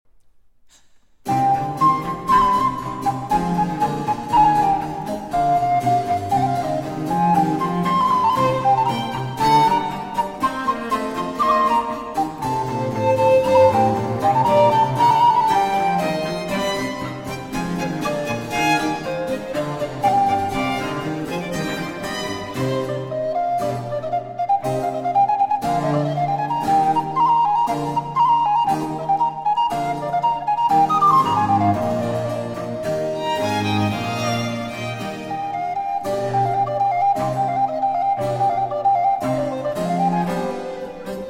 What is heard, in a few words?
Sonata For Recorder, 2 Violins & Continuo in C Minor, Fuga